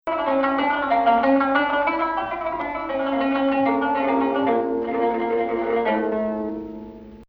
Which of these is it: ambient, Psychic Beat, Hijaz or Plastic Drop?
Hijaz